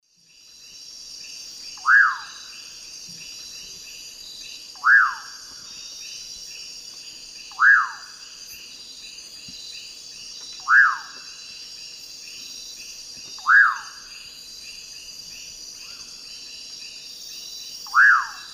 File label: Nyctidromus albicollis, song; S. America; Eastern Lowlands